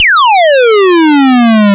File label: Game Boy FX, chiptune; Nintendo Game Boy